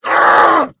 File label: moarg hurt